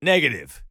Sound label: negative, Voices Emotes